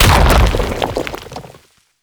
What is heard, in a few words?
Arm Proj By Explosion; Warframe; Man In The Wall